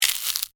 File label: Bite Chew Chomp, Single; Foley; Eating Sound Effects; Human; Blast Wave